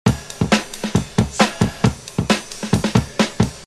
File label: funky soul shake